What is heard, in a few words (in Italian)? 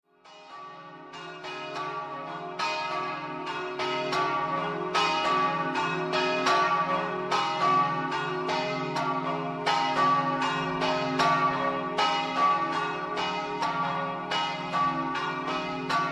campane; GAGLIANO